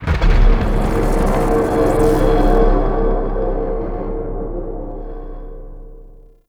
Warning Intro Quest; Enemy; Nimrod; Abilities

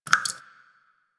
Water Drips; Ambience; Props; Warframe; The New War; Zariman; Quests

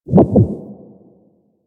Infested Jetpack Heart Beat; Warframe

